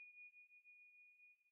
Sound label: sonar Tail Water Far Beacon Directional; Barotrauma; Real Sonar